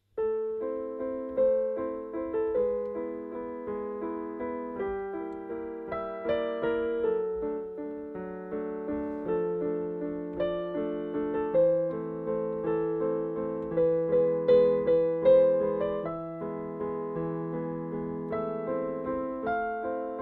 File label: piano